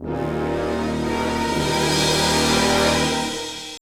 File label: Stinger; Ben 10 Protector of Earth; Wii